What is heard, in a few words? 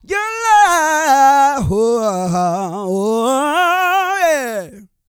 SING, Vocal Planet R&B & Dance; ILIO